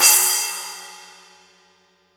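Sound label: cymbal, Real Drum Kit, ROCK KIT; Best Service ProSamples vol.43 - Real Drum Kits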